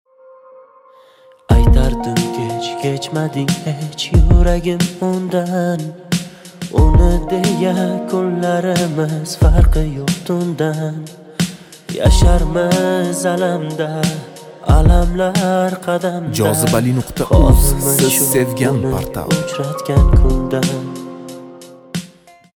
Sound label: Istanbul music